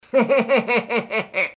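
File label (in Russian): звук смеха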